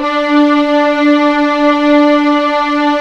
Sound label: D4 EXP MF, VIOLINS; East West; The Ultimate String Collection